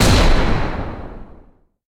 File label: Explosion; boomerang fu